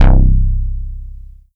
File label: MOODY C2; Q Up Arts; Dream Experience